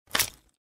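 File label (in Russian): otrez palec nojom, cut a finger with a knife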